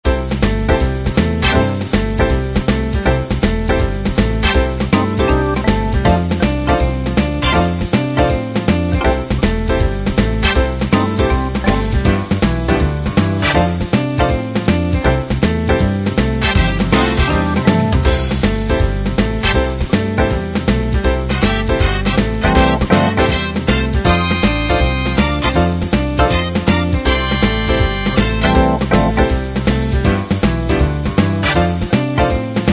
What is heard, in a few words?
blues chicago